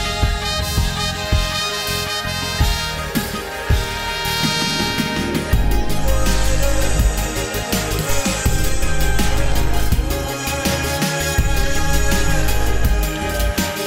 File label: bengali music ringtone